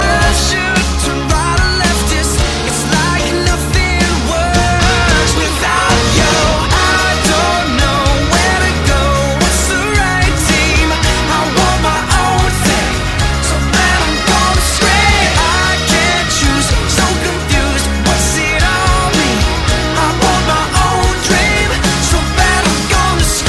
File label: scream; high school musical 3